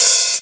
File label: Open Hihats, Cymbals; Vengeance Samples